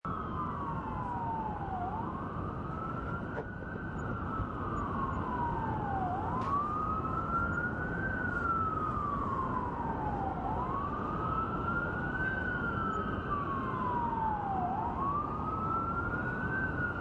five minutes of ambulance sirens during the nyc covid 19 crisis